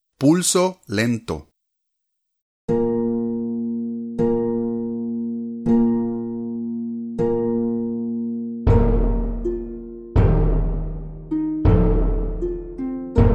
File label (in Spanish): LOS ELEFANTES pulso lento